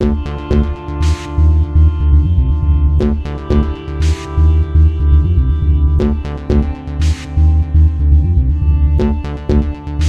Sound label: song; TankDefense